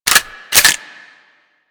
M16 Insert Clip, rifle; Grineer

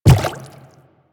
Inf Quadruped Medium Walk Water, Footsteps; Warframe; Infested

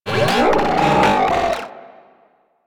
Talking Machines, Ambience; Warframe; Corpus Railjack

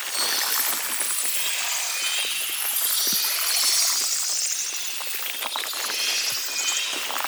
Root Cave Glowing Sap Loop; Ambience; Props; Neathuns Root Cave

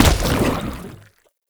Step Default, Footsteps

